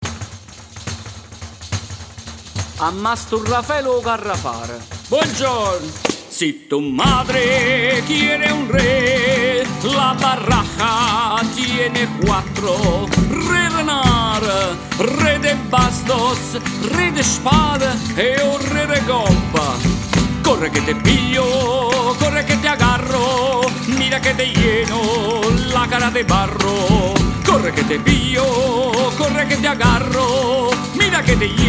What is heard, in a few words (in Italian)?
Tarantella spagnuola